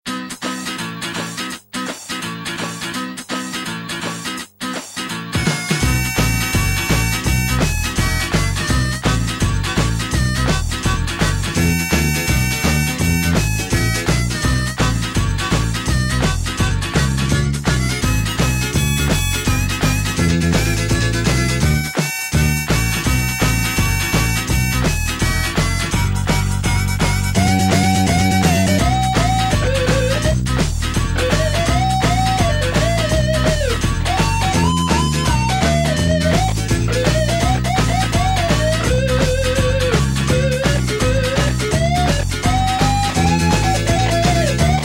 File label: bgm; ragemap2023